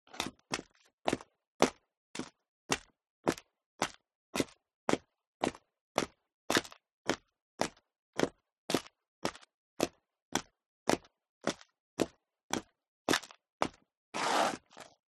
FOLEY STEP High Heels Concrete Grit Upstairs Sequence; smartsound